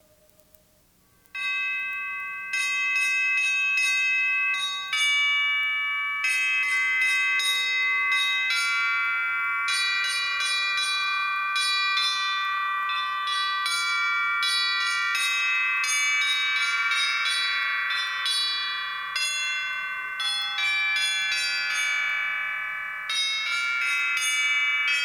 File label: Tubular Bells; East Berlin